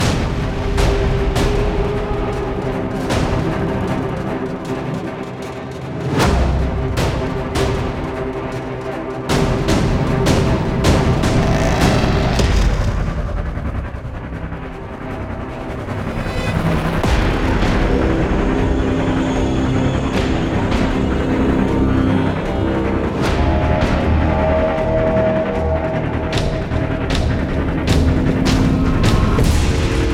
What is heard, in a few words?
Base Loop low